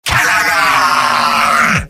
battlecry

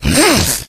rage, infected, male

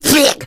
smoker attack

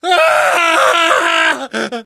hurt critical, survivor voice

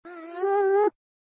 dematerializing; cartoon; gazoo; hannah-barbera

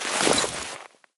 weapon inspect movement; tf

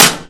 metal computer impact bullet

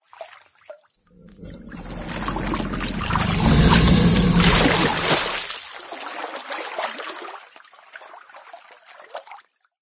gator; ambient; random animals; Left 4 Dead 2; Source Engine

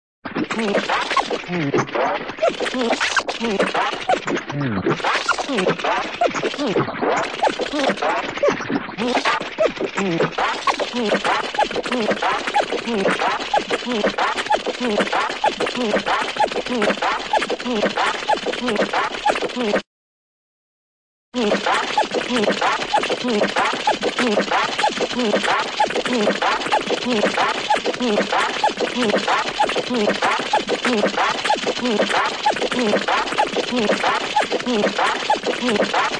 helicopter, cartoon squeaky copter; atom ant

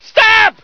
scream, scientist; Source Engine; bshift

